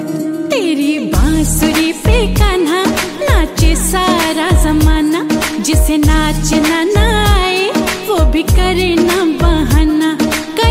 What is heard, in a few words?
Bansuri, Krishna Bhajan Song Ringtone; Kanha